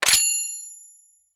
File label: Gale Boomerang Lock